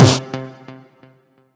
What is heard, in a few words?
combo power; quatuor